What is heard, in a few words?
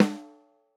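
SNARE HIGH SNARE, analog recorded drum; Sonimus; a series drum pack; A-CONSOLE; A-EQ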